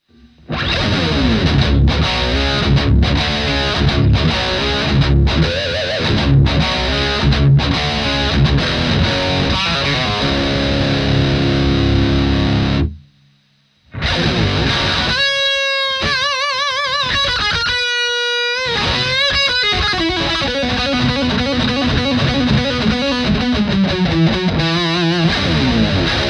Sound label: tone roland modern